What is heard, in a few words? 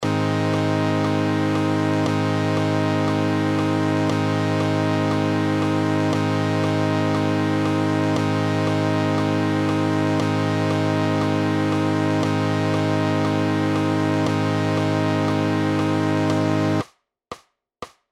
A major Scale Backing Track, guitar